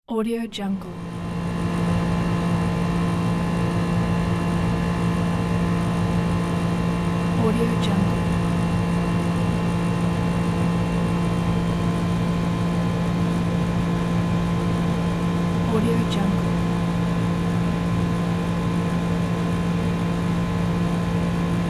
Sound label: industrial compressor